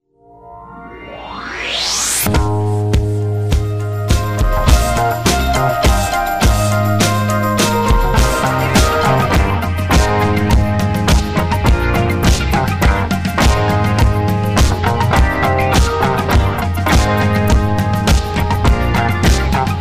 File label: Music Bed